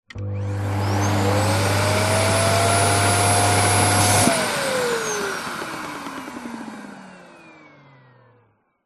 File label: household vacuum cleaner on run short off; zapsplat